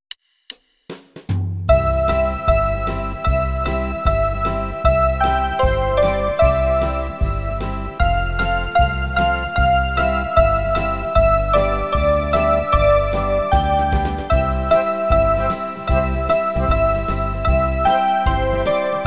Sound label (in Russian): Звонкие колокольчики